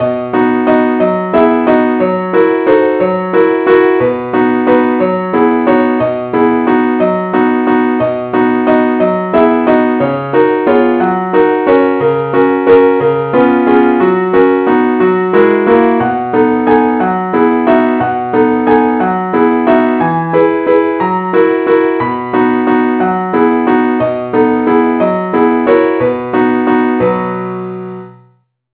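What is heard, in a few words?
advance accompaniment key b, keyb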